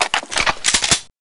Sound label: attachment